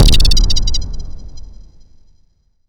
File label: MOOG PRC, Impacts, Hits & Booms; Distored Reality